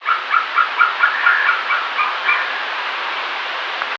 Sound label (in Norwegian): fugl